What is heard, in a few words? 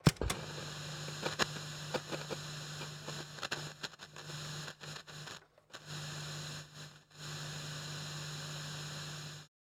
Portable Old Cassette Player, Clunk of play button with static noise; Sound Ex Machina